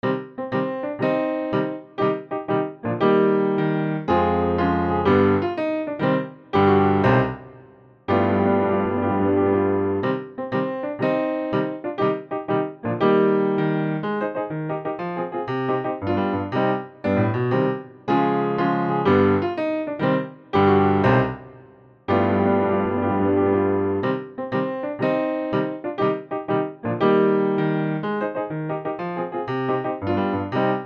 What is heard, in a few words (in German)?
Piraten (instrumental)